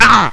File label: Ahhh!; Gravity Balls